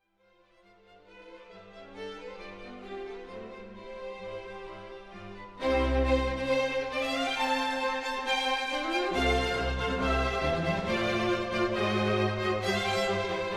Concertos for Piano; Mozart; Sondeckis; Concerto KV 415; Allegro

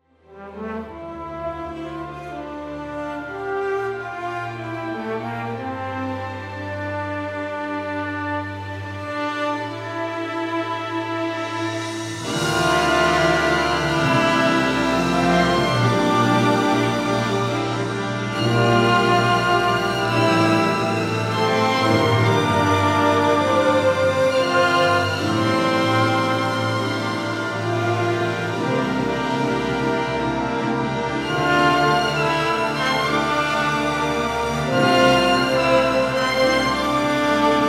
Trombone concerto; Šervenikas; Malcys

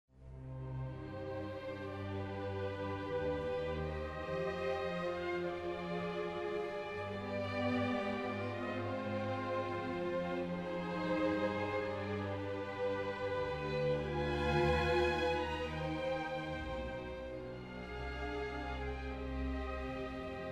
Passacaglia and Fugue in C minor; LNSO; Bach; Stokowski

